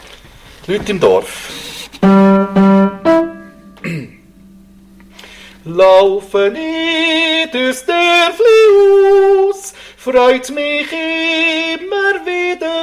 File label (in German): 1. Tenor